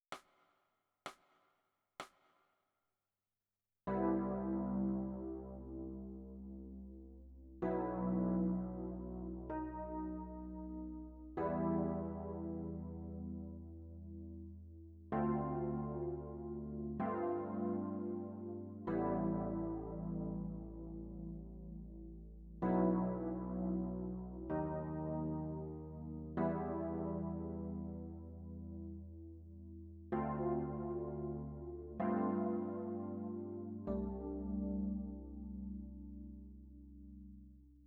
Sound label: E Piano